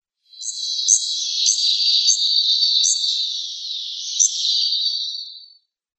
Taiga Flycatcher calls

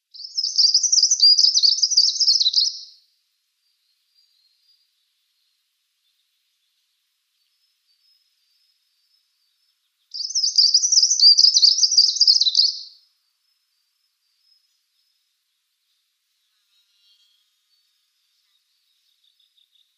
Two-barred Warbler song

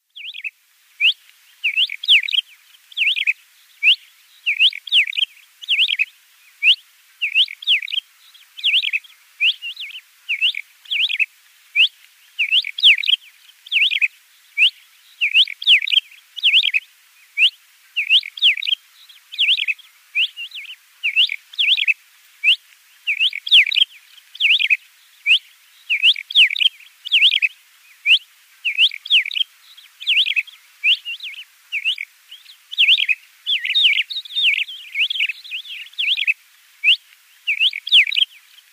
Desert Lark flight song Middle East

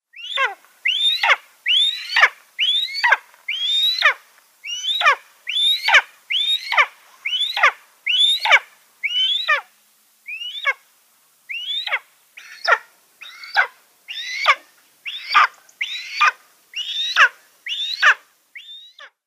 Imperial Eagle nestling

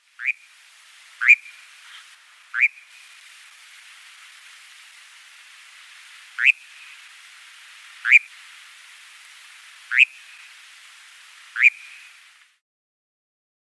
Eurasian Nightjar adult flight call

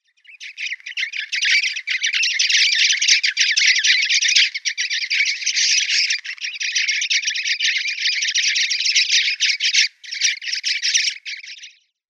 Sudan Golden Sparrow calls

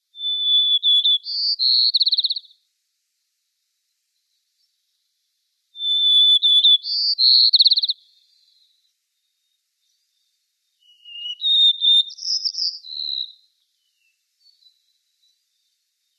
White-crowned Sparrow song

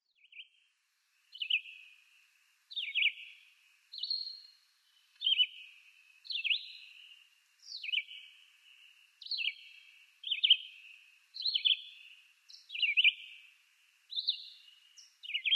Red-eyed Vireo song

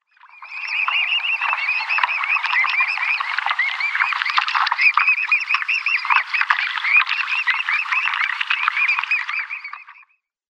Mute Swan downy Young

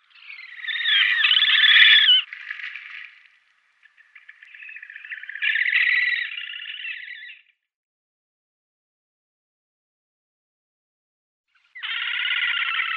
Slavonian Grebe calls

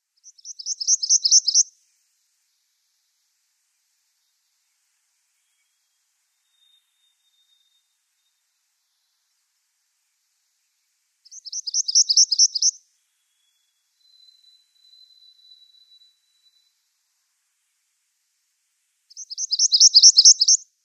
Palm Warbler song

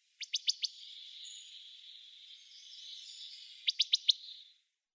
Wood Thrush calls

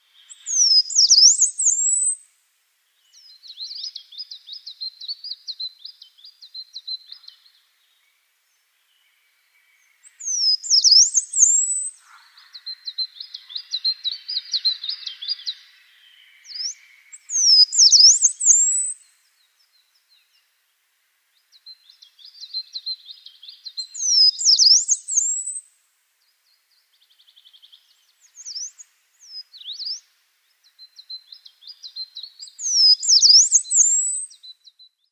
Yellow-browed Warbler song

